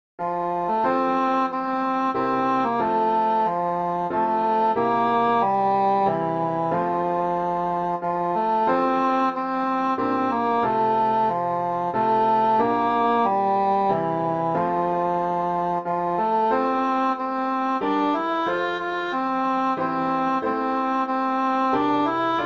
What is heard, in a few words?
sans day carol cor anglais and piano